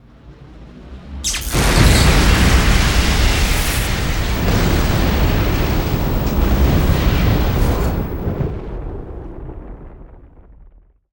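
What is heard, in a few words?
Explosion Large; SFX Kit; Destruction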